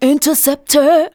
vocals sung; Techno Trance Essentials

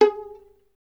STR VLN, Solo Instruments; Miroslav Vitous

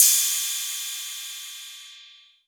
Cymbal, Novation Drumstation; DrumstationV1

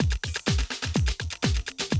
125, Classic Drum Loops

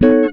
GTR CHORD; Univers Sons; Jazzistic

